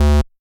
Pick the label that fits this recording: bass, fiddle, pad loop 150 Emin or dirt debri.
bass